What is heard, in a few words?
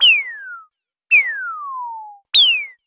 Cartoon Falling Whizzes